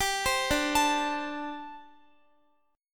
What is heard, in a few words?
D 7th Suspended 4th, down, Guitar